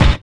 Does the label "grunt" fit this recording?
No